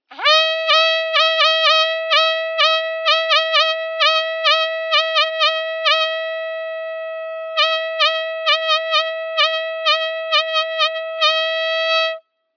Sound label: Hunting Horn